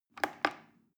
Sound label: plastic button little click regular slow